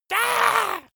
monster assault high; schreie

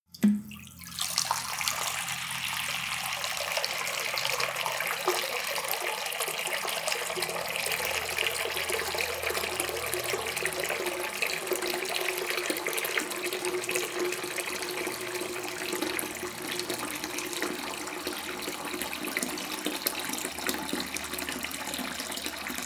sink water fill; haus-garten; badezimmer

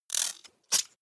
metallic rattle click, robot; comedy cartoon

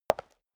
step high heels concrete single left; schritte; Footsteps

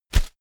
whoosh shredded paper hard; swooshes-swishes

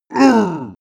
male giant hit; menschen; schreie

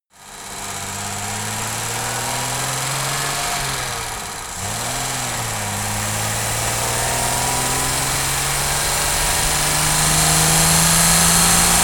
car jaguar e-type drive engine

